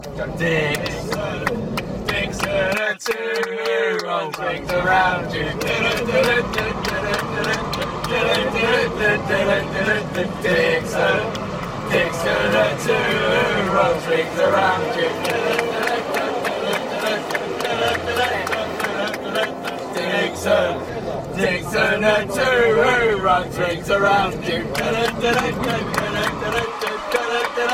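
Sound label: fanchants, football chants; dickson etuhu runs rings around you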